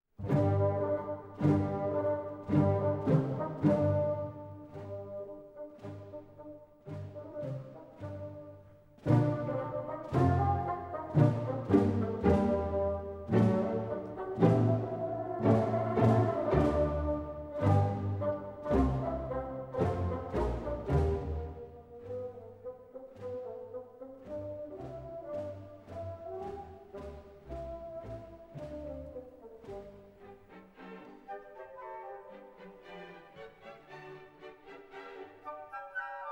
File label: Carmen Suite for orchestra, Entr'acte; Carmen Suite for orchestra No. 1 (assembled by Ernest Guirard); 2. Entr'acte 2. Allegro mod; Bizet